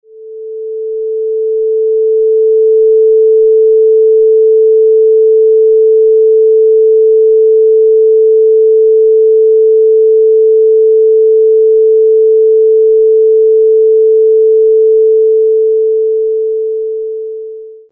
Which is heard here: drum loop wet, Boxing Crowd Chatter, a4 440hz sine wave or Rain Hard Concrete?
a4 440hz sine wave